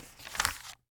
Book Page Turn; Office Supplies; 1001 Sound Effects

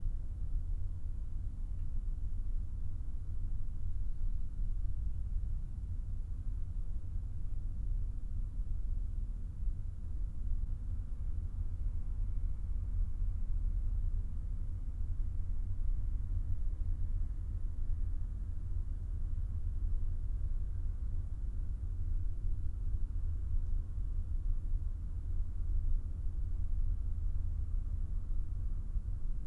Room Tone; Inquisitor 3

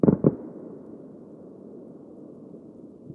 firework single; best service Blue Box

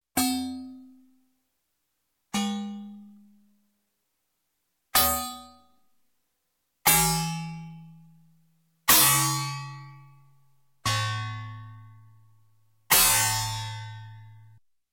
Space Space Chime, Several Hits, Electronic; Sound Ideas 2000 Series

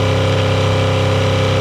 65 Corvette Loop; Automobiles

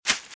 Velociraptor - Footstep - Grass - Run - Right

